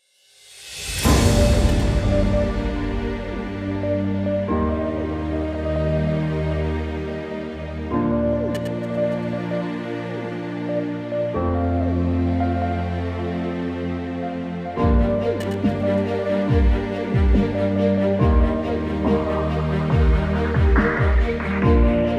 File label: inspirational cinematic dubstep; No Studio Guto Lopes